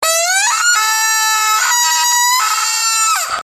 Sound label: rabbit squeal